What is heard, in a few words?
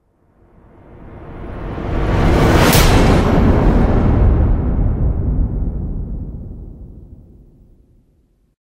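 low rising sound; Нарастающий гул; Звук саспенса; Звук саспенса, так же как и жанр саспенс в кино создает невыносимое, напряженное ощущение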